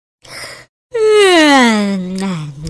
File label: russian sigh; ringtone